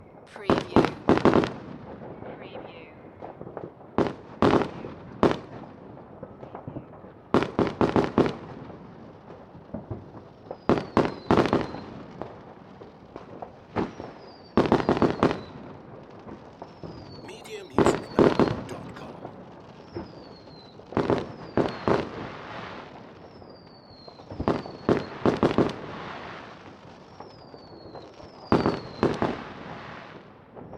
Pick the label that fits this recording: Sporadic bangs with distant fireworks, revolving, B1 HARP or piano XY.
Sporadic bangs with distant fireworks